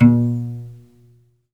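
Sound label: SOLO CELLO, Synclavier Strings; ILIO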